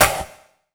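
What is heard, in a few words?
snare drum, Drum & Bass, 168 BPM; Ueberschall; Drum & Bass Resonance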